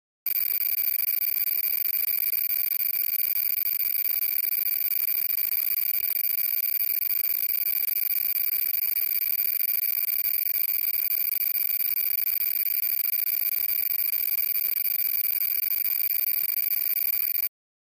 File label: Computer Read Out